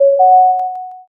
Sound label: m notify